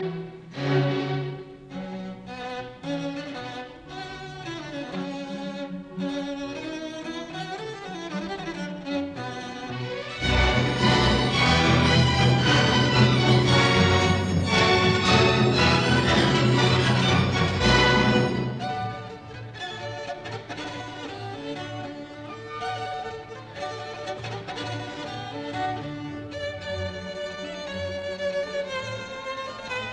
Cello Concerto in B minor Op 104 B 191 III Finale Allegro moderato; Dvorak